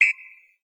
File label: Emote Cheer; zelda